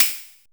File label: NOISE HAT, synth perc; Ambient; Zero-G; Ambient 1